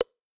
Dialogue Text End; helltaker

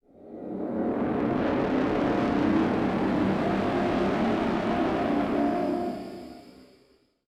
Camel Grunts Gurgles; Inside

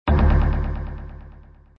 Level End; pencak silat 2 2002 gamerip online

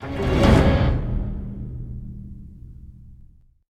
Stinger; the walking dead survival instinct gamerip

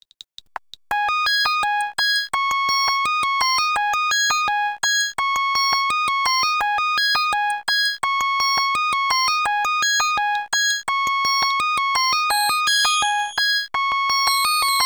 BGM Remix; Puzz Loop; puzz loop 2 cp system ii arcade gamerip 2001

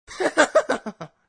Laughs; Sonic.EXE

